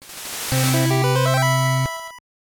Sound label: Nice Jingle; Kaijin Phantom Zona